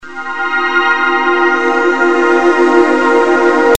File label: Hardcor